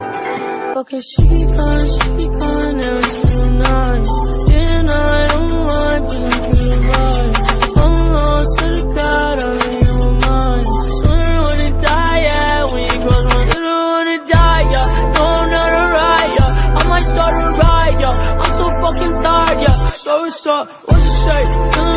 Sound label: English Ringtone